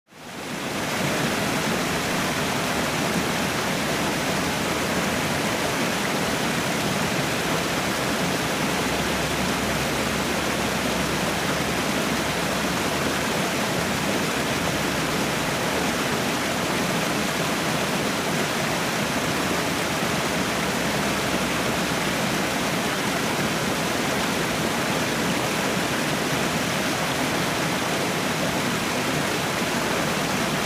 waterfall

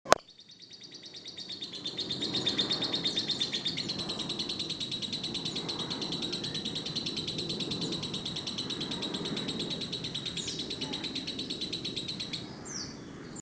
audio lovebird

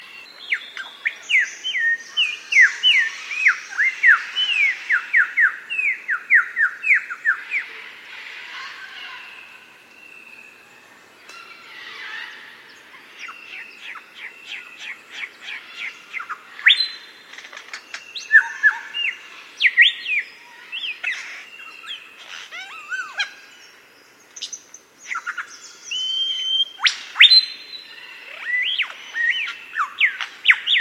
superb lyrebird song; locklate

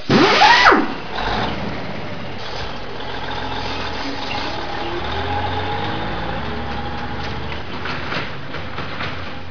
trucks

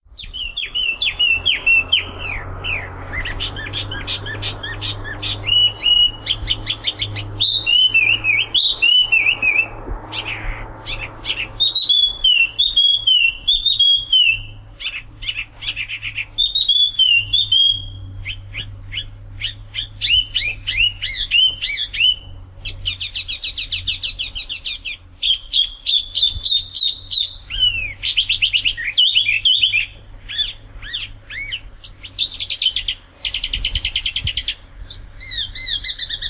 spot throated babbler

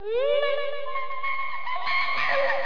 comic

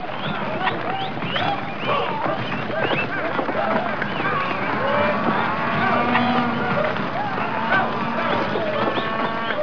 background

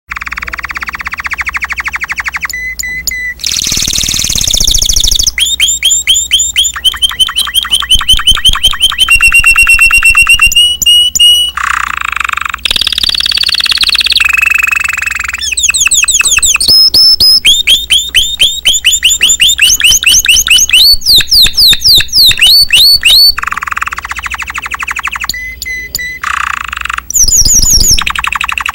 timbrado

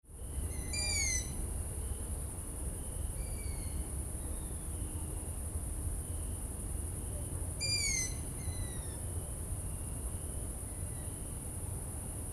asio otus

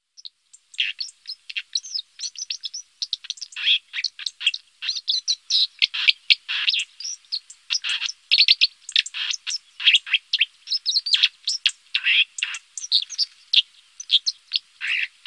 waterspreeuw white throated dipper

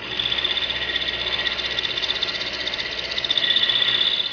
electronics

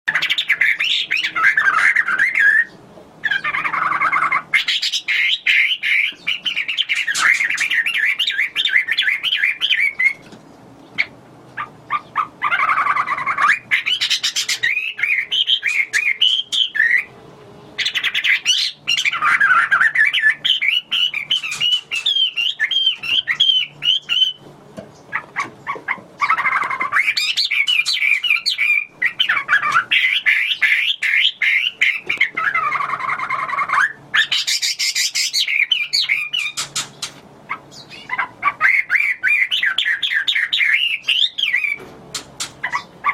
hwamei china

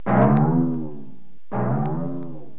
musical

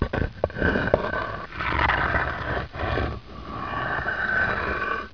nature

